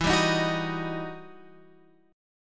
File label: E Minor Major 9th E